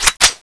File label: smg clip in, weapons